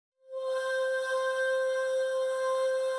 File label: Melody C High Head 1 Octave